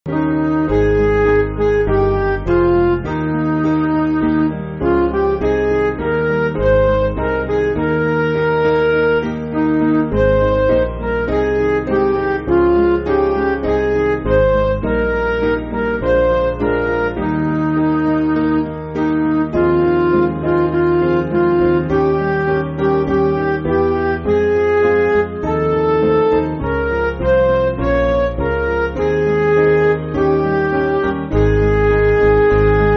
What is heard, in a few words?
Midi